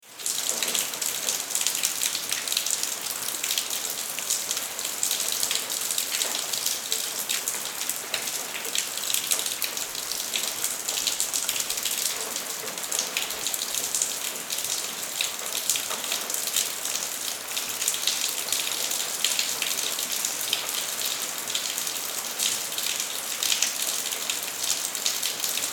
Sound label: rain in small city